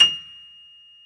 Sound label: GRAND PF; B-GRAND PF